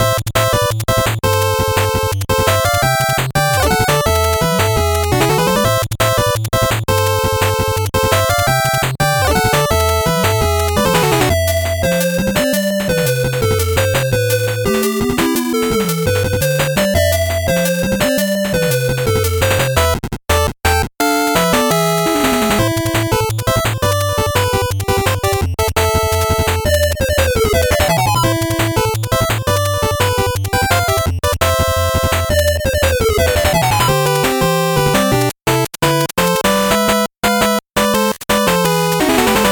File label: 8bit bgm normal